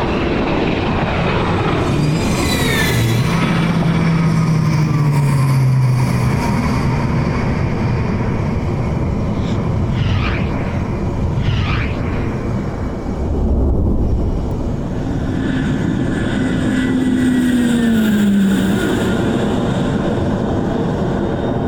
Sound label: Planet Ambience Loop; ycrpg